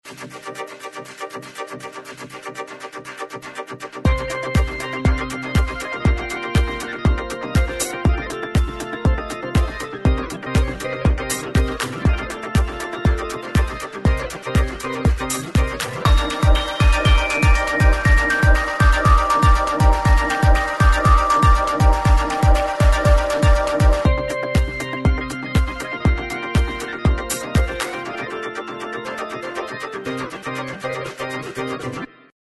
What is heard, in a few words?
synth power